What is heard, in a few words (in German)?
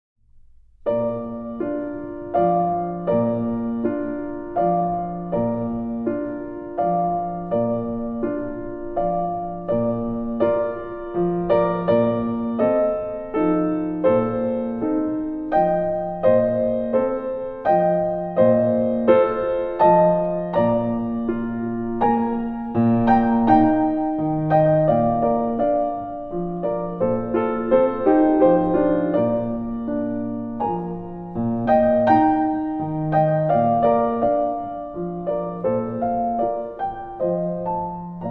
Wiegenlied backing